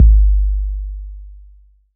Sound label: 808, Bass